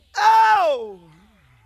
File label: ooh